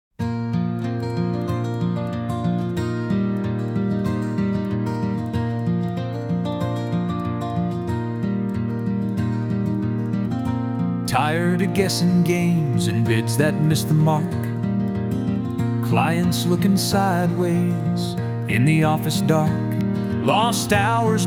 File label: JINGLE; IOMI; Office Moves; Online training for movers